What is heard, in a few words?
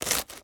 slice bread thick; cooking game actions; esmteam